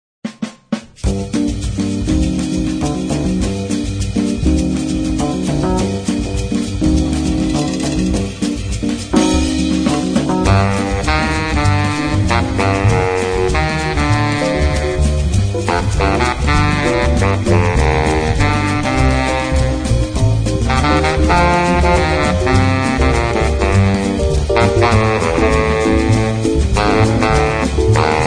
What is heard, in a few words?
Bossa Nova